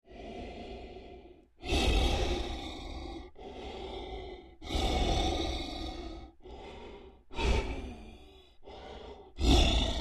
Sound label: Creature Large Breaths Deep Laboured; Scary Breathing Sound Effects; Big Room Sound